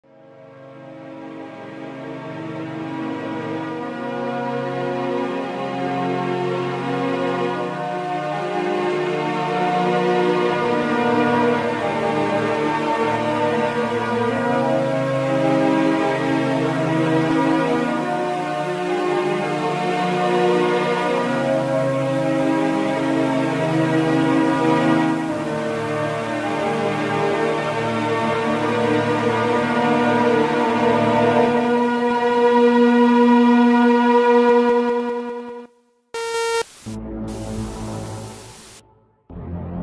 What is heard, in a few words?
beach storm